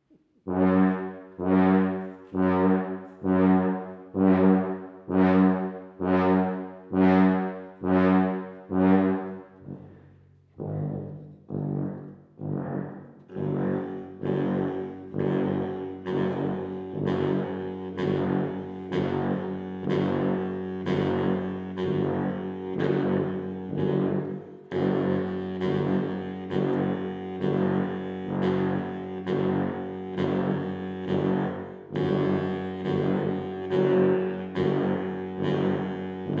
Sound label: a grand noise